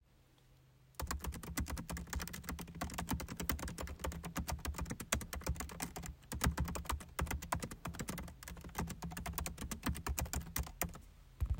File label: typewriter sound